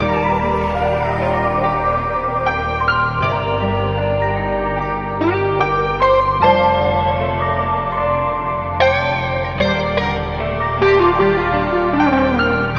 piano and guitar; loops; Phonky

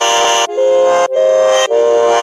keys, Phonky loops

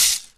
rattle

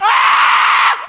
scream

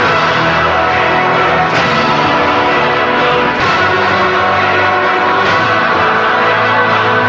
voice, Sad

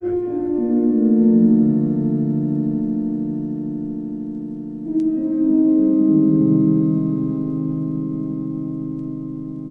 soothing, Electronic Loops

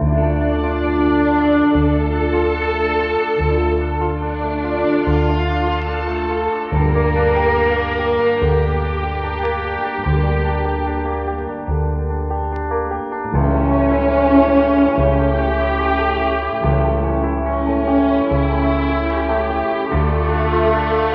strings and piano, sad loop; loops

